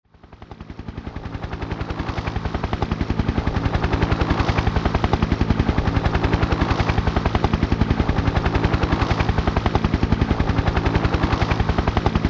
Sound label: helicopt